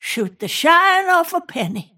last hit pain; snapfire

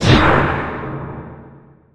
shadow realm attack; weapons; dota; dark willow

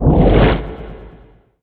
riptide precast; weapons; dota; naga siren

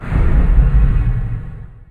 frozen sigil cast; tusk

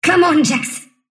sylph move pain; dota; dark willow

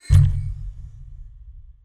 teleport go; ui; dota; vr

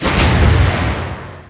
life break; weapons; dota; huskar

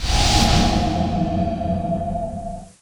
shukuchi; weaver

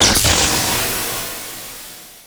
shock; dota; shadowshaman

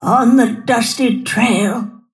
move pain; snapfire

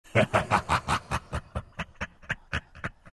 shadow demon laugh; dota

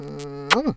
kiss

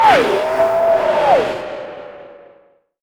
sharpshooter projectile; weapons; dota; hoodwink

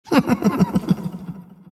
crown laugh; dota; monkey king

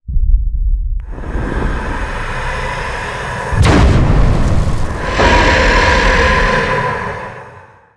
reveal; underlord

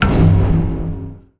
keybind open, ui menu

